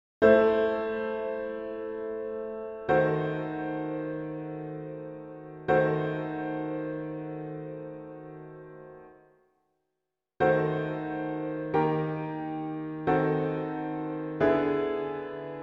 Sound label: Qui tollis (Gloria) - Piano Only; Vivaldi